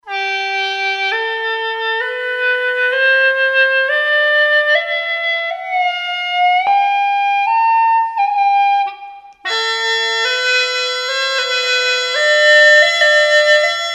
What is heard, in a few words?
hulusi